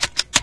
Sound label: keyboard clicks enter; ambient; Source Engine; video game